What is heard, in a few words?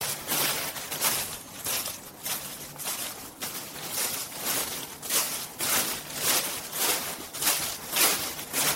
walking on leaves